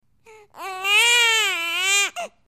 Baby Whines Cries Bre

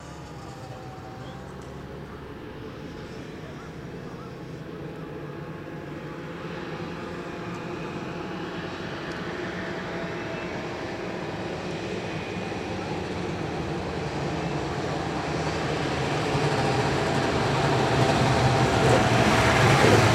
Snowmobile In and By, Then Out

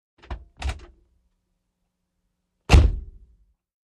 Aston Martin Door, ext.; VEHICLES ASTON MARTIN: DOOR: Door open & close - take 2.

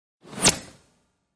Button Thin Turn On

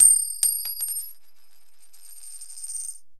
Coin, Large, Fall on Concrete

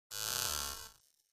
Button Sci Fi Beep T, buttons & beeps

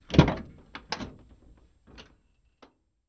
Cutlass Door Open Med, doors

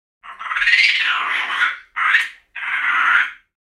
Monkey Capuchin Chit

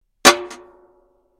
Frying Pan Or Pot Clan; pots & pans

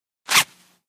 Zipper Short Light

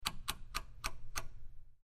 Rotary Dial Turns, switch clicks

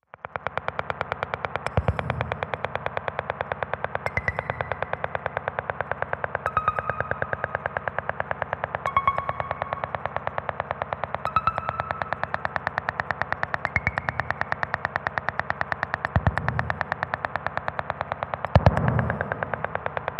Resonant Percolator Machine; machines winches

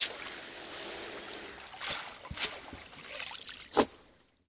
Pulling Net Boat, fishing boat

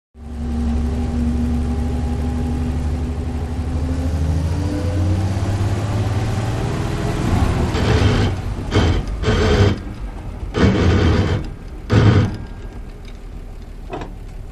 Bus Bus Away Gear Grin

